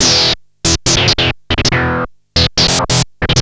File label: Treat Guit, Industrial Nasty